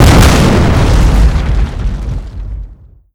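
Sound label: 100 ton explosion; Modern Warfare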